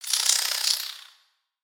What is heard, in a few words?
Spider Sleep; creatures mechanical; Lego